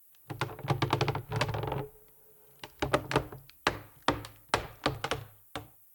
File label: Exterior Wood Creak; The Town; 3D Environment